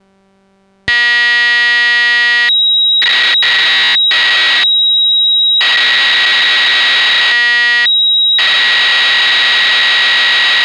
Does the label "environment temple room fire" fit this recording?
No